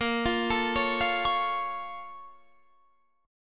Bb Minor Major 7th down Guitar Standard, chord; BbmM7; arpeggiated; JGuitar

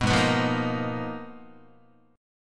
G sharp Minor 6th Add 9th G sharp Guitar, chord; G#m6add9 strummed; 2,4,2,3,2,2; JGuitar

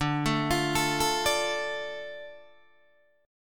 D Minor D Guitar DADGAD

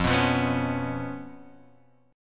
B Suspended 2nd Flat 5th F

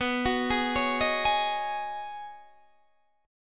B 6th Add 9th B Guitar Open D, chord

